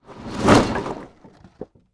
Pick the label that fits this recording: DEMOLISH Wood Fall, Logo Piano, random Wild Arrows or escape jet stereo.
DEMOLISH Wood Fall